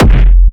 Industrial Techno Kick F, kick; f major

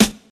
00s nice rap snare drum sound g sharp key

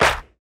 raw clap single shot c sharp key; single hits one shots

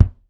tight kick sound g key

